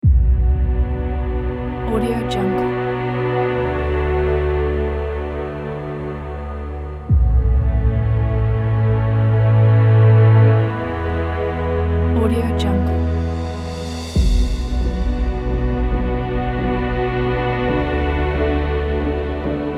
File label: inspiring cinematic; alexshulgin